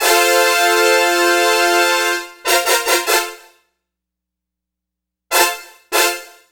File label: Brass Section; Synthetic Winds; Dr. Fink's Funk Factory